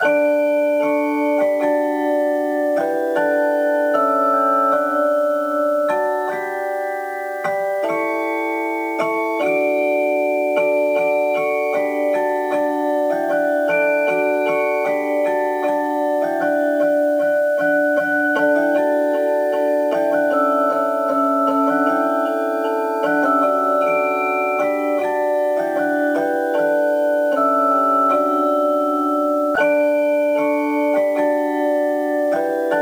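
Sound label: background music cafe; yakuza ryu ga gotoku kiwami 2 pc gamerip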